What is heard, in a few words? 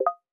pick flat